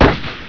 HUNTER; 枪炮声音素材

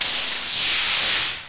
ZAP

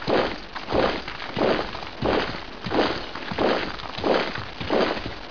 ARMY

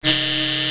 laser

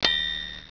TYPE BELL; 铃声素材

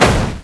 CRASH, 碰撞声音素材

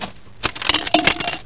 COIN RTRN

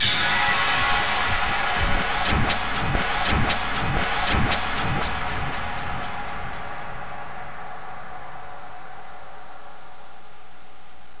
ALIEN; 机器

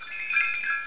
JINGLE

